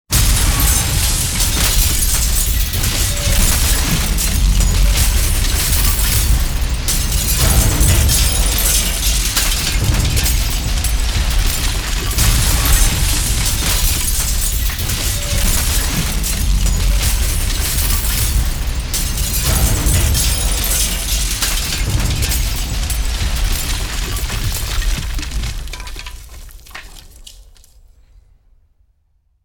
Zdanie rushitsya

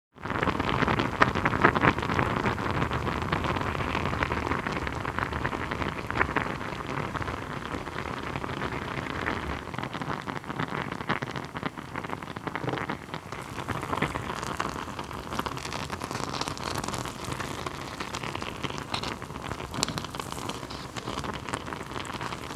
Eda puzyritsya na skovorode

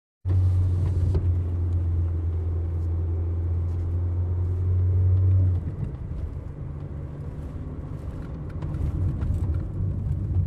Vnutri avtosalona

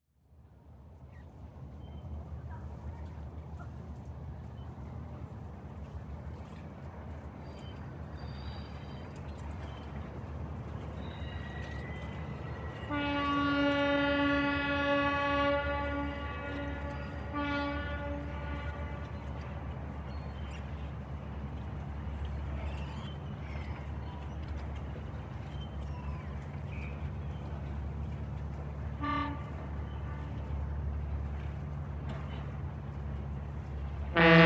Port

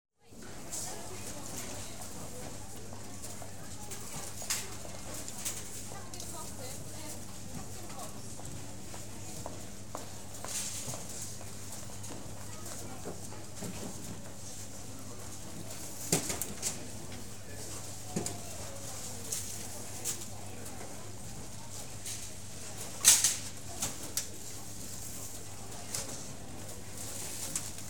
Supermarket

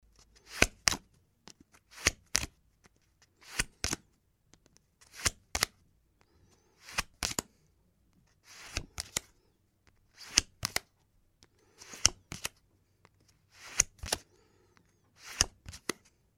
Otkrytie kart

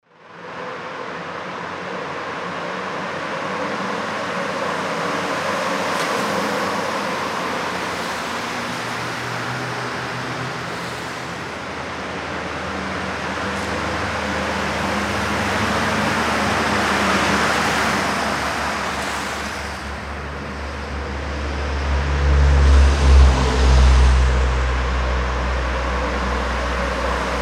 Tonnel edut avtomobili

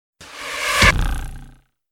Strela vtykaetsya

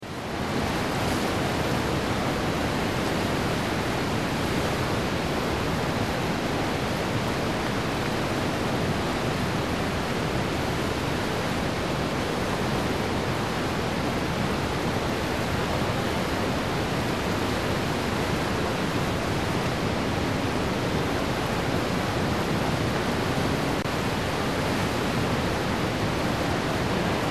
Vodopad

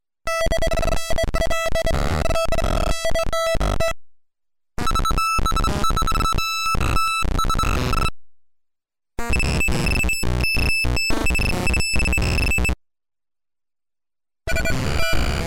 Transmissiya peredacha dannyh

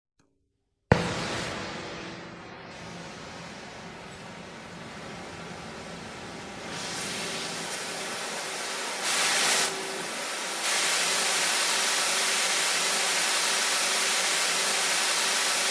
Avtogen

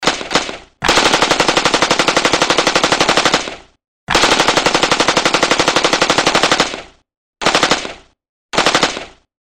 Kalash AK47

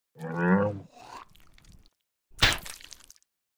Verbljud pljunul

